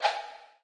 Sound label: torch wave; Be Cool Scooby-Doo! - It's Dark Out There; ThrillGames